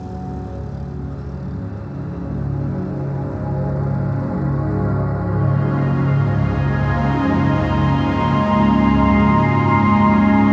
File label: Water Music